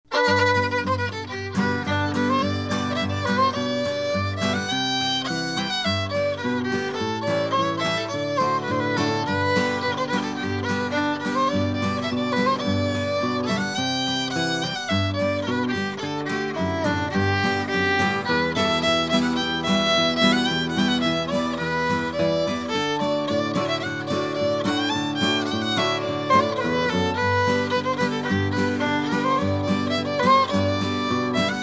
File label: Two Step